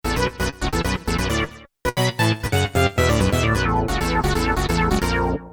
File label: synth prog; Creamware minimax